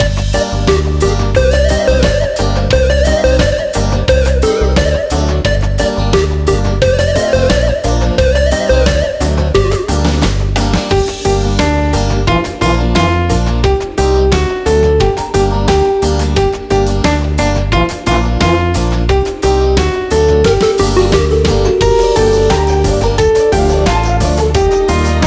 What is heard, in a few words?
karaoke